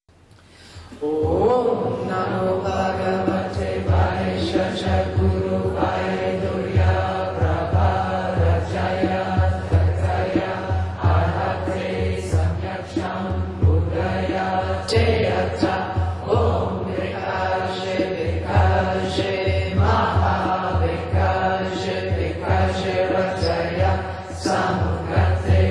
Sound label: Medicin Buddha Mantra long